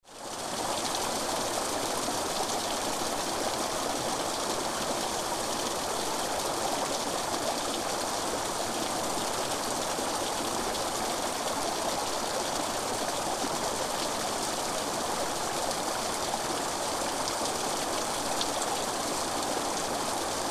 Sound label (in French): Dades Imzzoudar